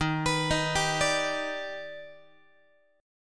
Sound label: Eb Augmented Major 7th, Guitar; arpeggiated; 4A,4E,5A,5D,5Gsharp,6Csharp